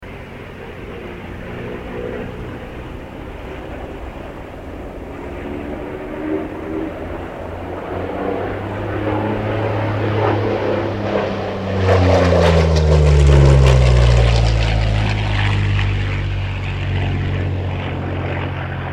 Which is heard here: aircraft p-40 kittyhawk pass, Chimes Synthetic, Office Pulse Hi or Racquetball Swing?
aircraft p-40 kittyhawk pass